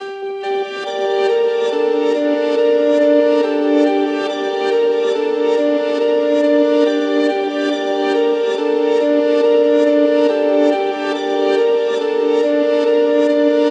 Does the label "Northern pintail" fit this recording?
No